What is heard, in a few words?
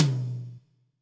tom, drums; Yamaha SY85